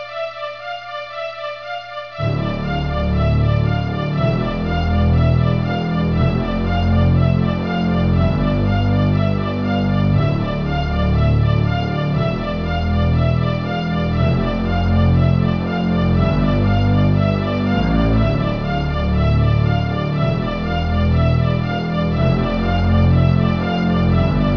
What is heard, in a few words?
suspense; svencoop